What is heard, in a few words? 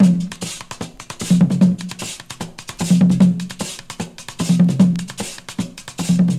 150 bpm modern breakbeat f key, kick tuned to the F note